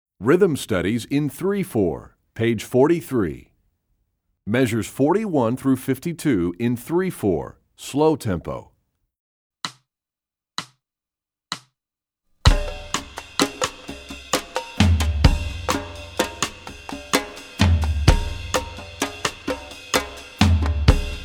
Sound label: Rhythm Studies, Percussion; EE2000 Book2